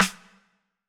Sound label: hiphop snare, Effect on